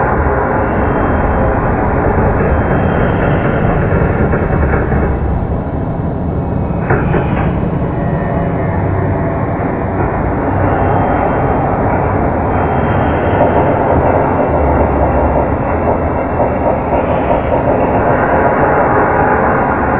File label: creaky metal; dm borehole